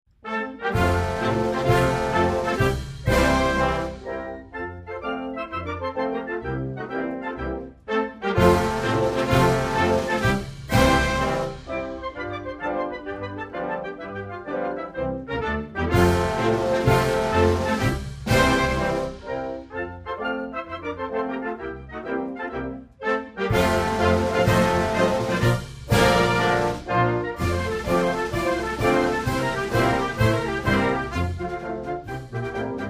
anthem

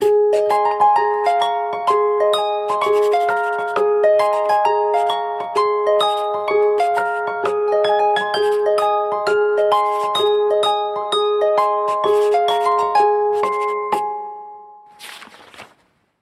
Writing adventure, samsung touchwiz

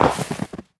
snow golem death, minecraft; snezhnogo golema

